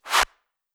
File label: hand clap reverse low